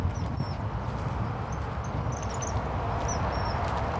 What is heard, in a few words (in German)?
eisvogel